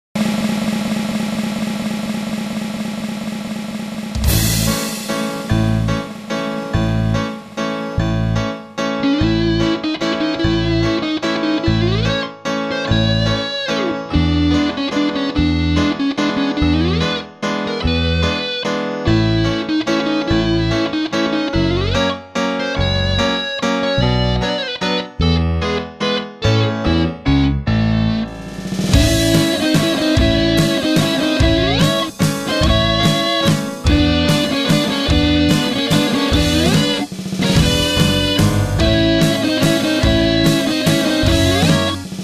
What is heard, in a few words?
waltz